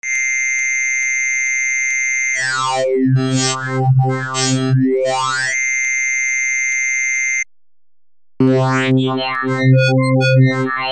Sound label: wavetables, synthesizer basics